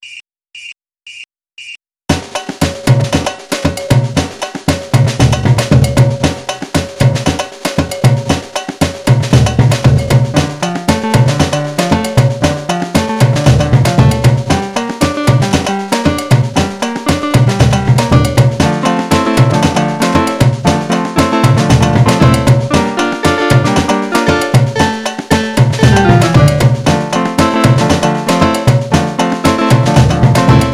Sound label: afoxe